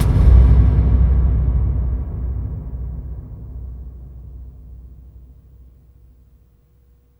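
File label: CAVERN, AMBIENT DRUMS; Spectrasonic Distorted Reality 1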